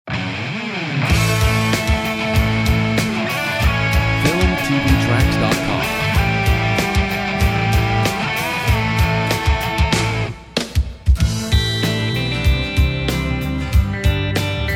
Vintage Band

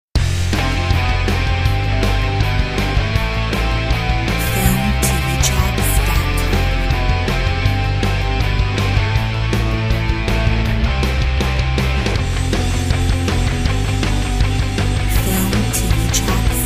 Indie rock; Filmtv-tracks

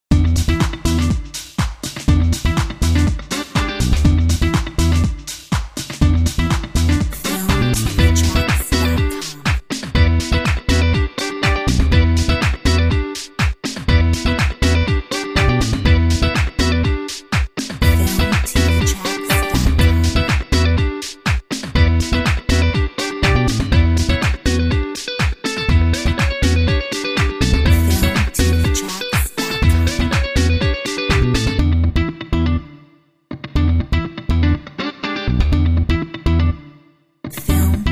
Funk

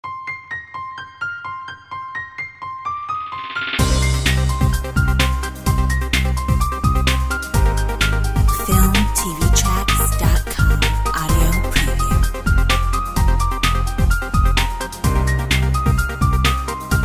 piano rain

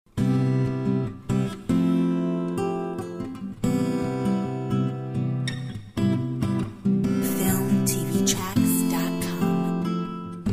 Sweet Bossa

